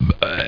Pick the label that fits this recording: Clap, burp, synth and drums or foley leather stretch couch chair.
burp